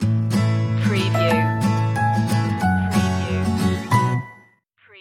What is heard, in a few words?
sassafras stinger